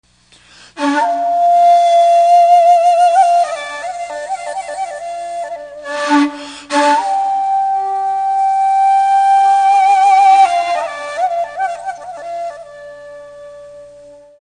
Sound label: odaiko, taiko